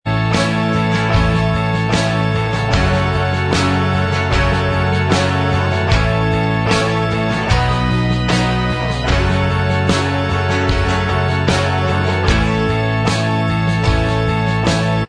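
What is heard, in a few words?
instrumental, backing track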